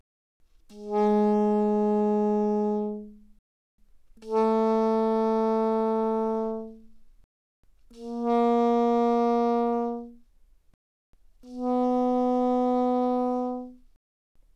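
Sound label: Soprano Sax No Vibrato pp Ab3 B3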